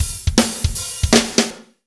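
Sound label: fast shuffle, Roland drum beats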